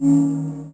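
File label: C minor synth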